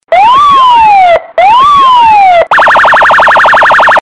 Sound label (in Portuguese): policia cirene